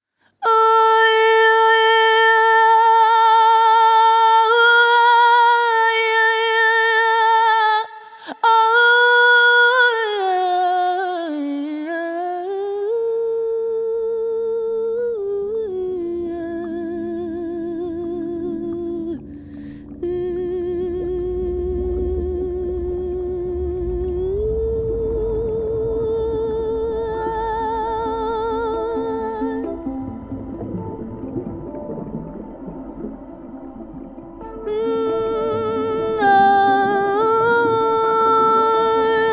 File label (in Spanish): sirena